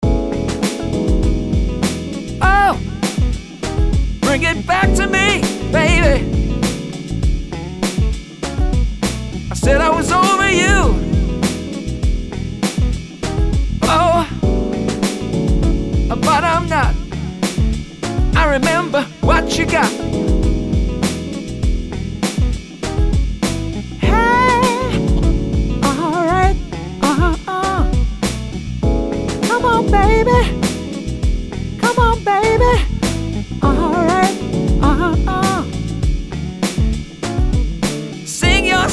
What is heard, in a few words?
Vocal Attack